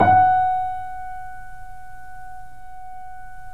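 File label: F#1, Bösendorfer, 3D Pianos; E-MU Producer Series Vol. 5 – 3-D Audio Collection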